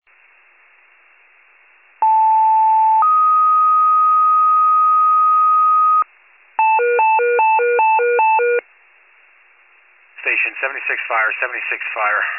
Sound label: fire, pager alert